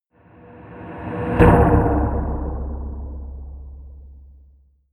soft wobble hit

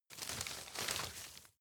grab crunch medium

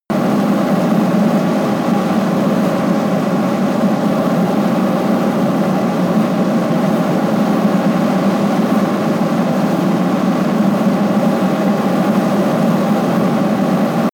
missile small fly loop close

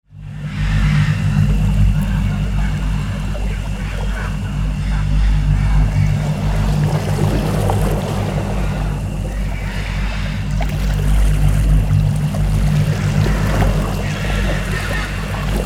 monk bong ambi

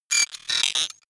sci-fi electromechanics small stutter evil

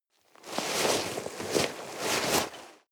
nylon leather walk

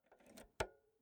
insert jack multi socket slow

